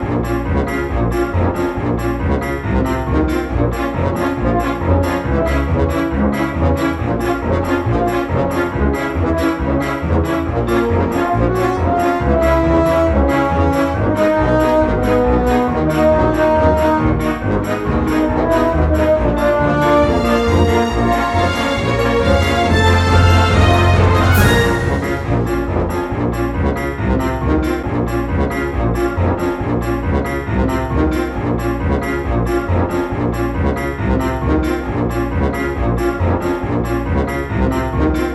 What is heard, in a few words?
Crazy Factory Loop; Lost Ruins